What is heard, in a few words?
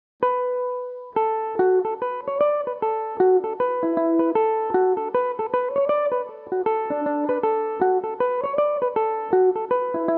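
D major, mandolin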